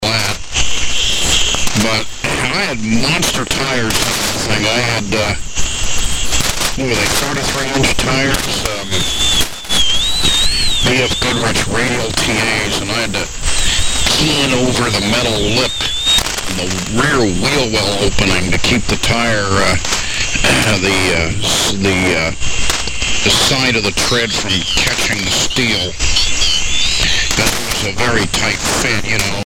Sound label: fart